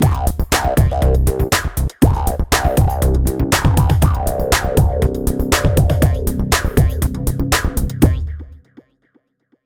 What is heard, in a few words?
Signature Sound; FXHome; Signature Tracks